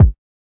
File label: kik